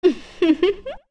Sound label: Giggle; Treasure Chest Shop Owner giggling